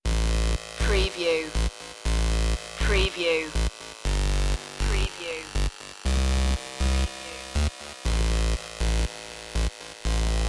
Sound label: electro chip